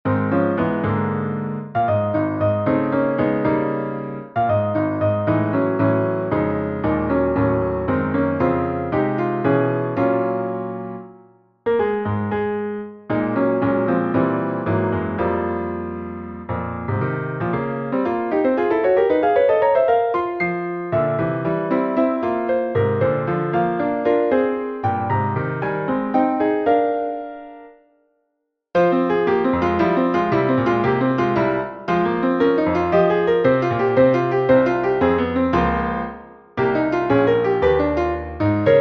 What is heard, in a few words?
solo piano; five incidentals